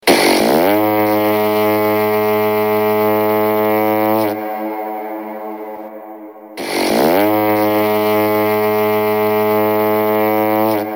alarm

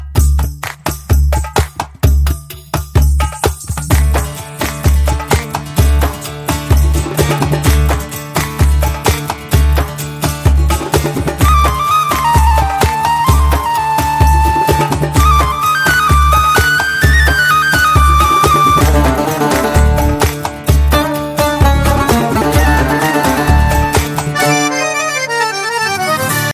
banjara tune

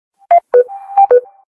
SMS

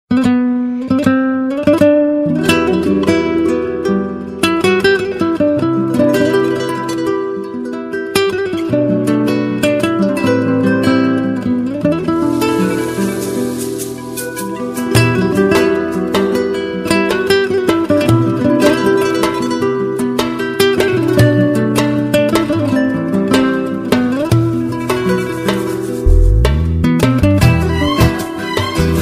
nice gitar, ringtones, latino

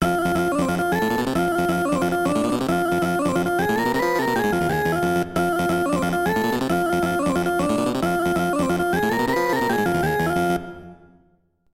Level Up

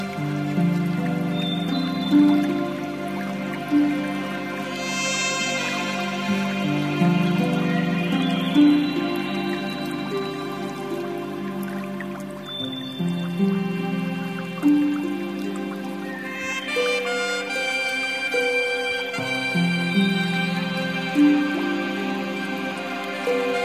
rock meditation

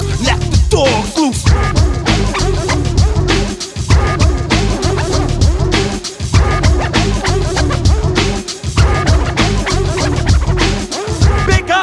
hip hop perfect, ringtone

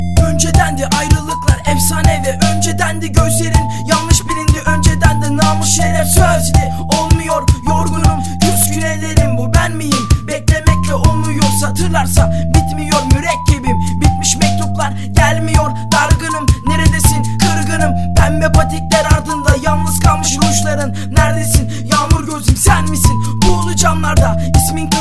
rap, hip hop ringtone

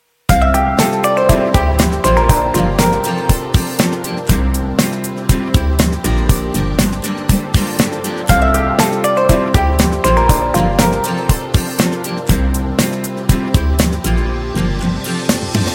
nokia news; ringtones

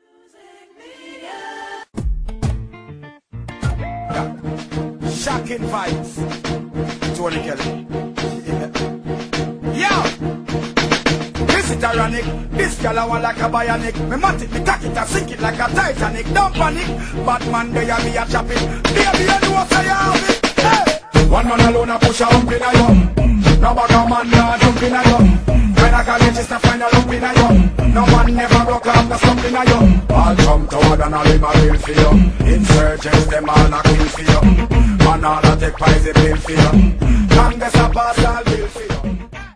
hmm hmm

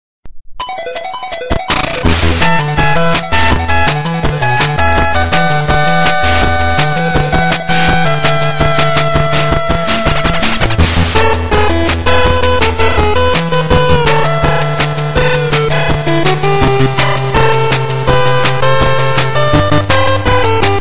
8bit

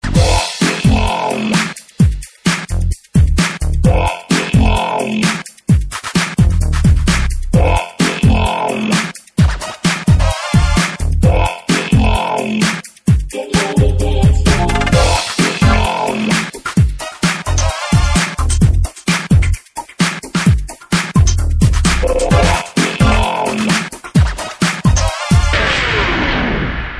funky, ringtones electronic

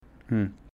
Hum

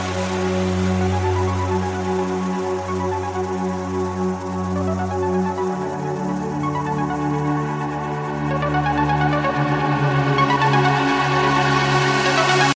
Electro Ringtone

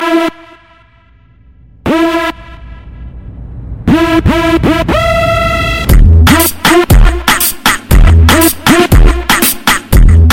Phat bass

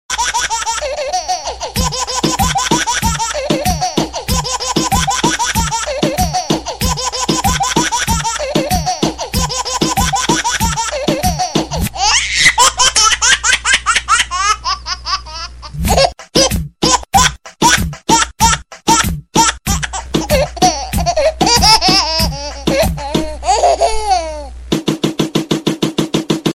baby rap new version

